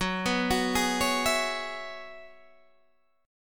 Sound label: Gb 9th Suspended 4th Gb down Guitar Open D, chord